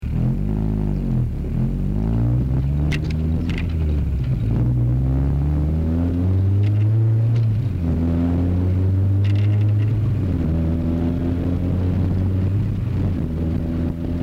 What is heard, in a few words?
5 gear shift